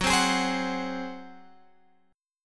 Gb Suspended 2nd Flat 5th, Guitar, Open G; 16,17,18,17,19,x; down; chord shape

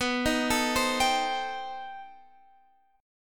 B 7th Sharp 5th Flat 9th, guitar

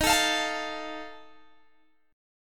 D sharp Suspended 2nd Flat 5th, Guitar, Open G

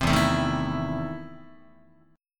E Major 7th Suspended 4th Sharp 5th, Guitar; EM7sus4#5 strummed

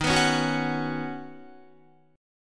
Eb Minor 7th Sharp 5th Eb down Guitar; strummed

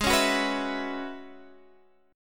F sharp 7th Suspended 2nd Suspended 4th G sharp

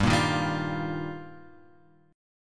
G Minor 7th Sharp 5th; Gm7#5 Chord {3 1 1 0 X 1} strummed

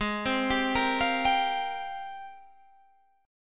G sharp Major 13th; G#M13 Chord

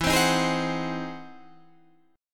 E Augmented Major 9th, Guitar, Half Step Down

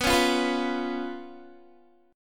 B Suspended 2nd Flat 5th, Guitar; strummed; 4F,4A,5C,5G,5C,6E

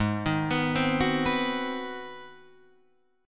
G sharp 7th Sharp 9th, Guitar, Open D

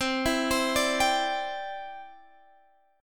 C Major Add 9th, Guitar Standard; arpeggiated; JGuitar

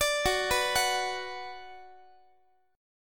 F sharp Suspended 4th Sharp 5th, Guitar; arpeggiated; x,x,17,16,15,17

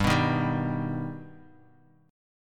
G Augmented; G+ strummed; 15,14,13,12; JGuitar

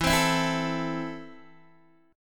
E Suspended 4th Sharp 5th E, Guitar, Open D; down

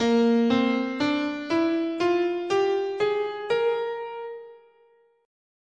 Bb Lydian Dominant, scale

